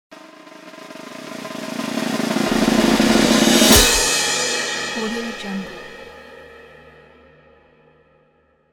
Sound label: drum roll